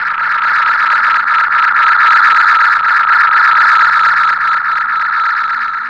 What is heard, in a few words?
ALARM; Best Service; XXL Production Effects; ALARMS; Sample CDs